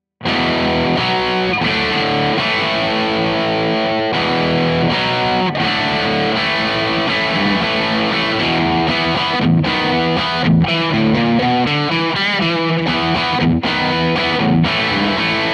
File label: rock chords; jensentone